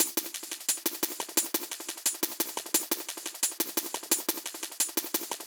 Addon Loop, 175

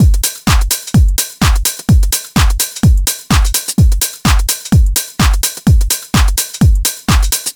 Teched Drums Full, 127

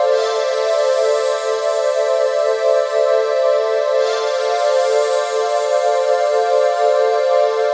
Chords

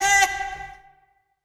Vox African Eh

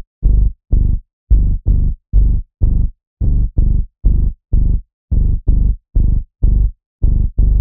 bass G 126; gang

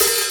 hihat open choked

